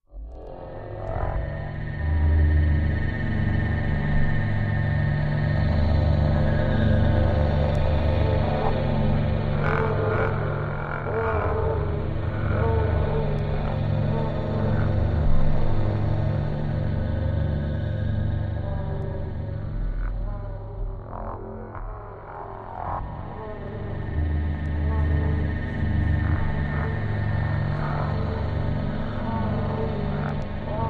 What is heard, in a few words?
Demon Dreams Creature, eerie ambience